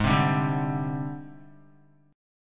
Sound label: Ab Major 7th Flat 5th Ab, down, Guitar; AbM7b5 strummed